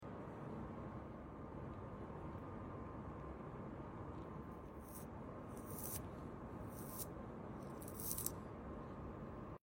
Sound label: brushing eyelashes